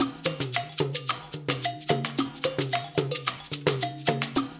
LOOP, Percussion

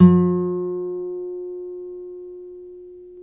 NYL NV G, nylon no vib guitars; Spectrasonics; Hans Zimmer Guitars VOL-1

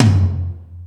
Toms, E-MU Pro-Cussion

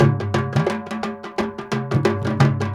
INDIA R, IND ENS PH, Heart of Asia

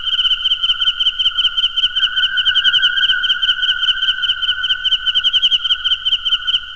ANIML; Zero G; Man Machine

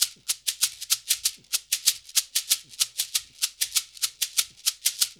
LOOP, SHAKERS, Percussion

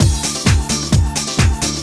DRM 130, House, LOOPS 130; Best Service ProSamples vol.10 - House; AKAI

